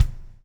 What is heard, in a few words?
BLUES TK, Backbeat; Spectrasonics - Backbeat